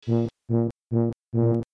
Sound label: instrumental